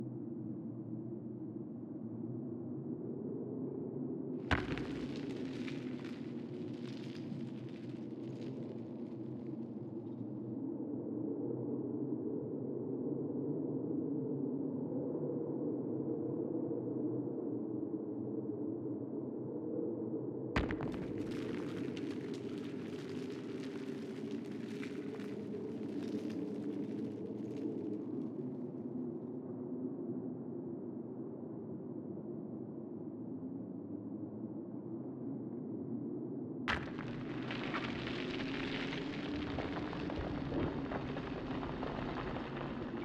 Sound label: cave dry